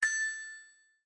Garden Ding; Windows 7

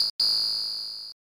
WHISTLE; sanrio world smash ball 1993 snes

